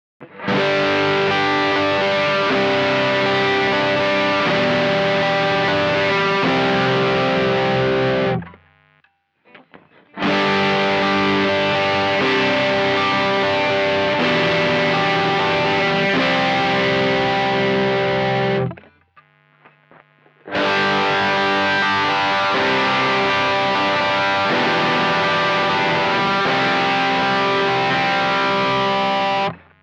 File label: P90 Overdrive Rhythm